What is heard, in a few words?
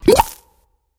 Lava Bubble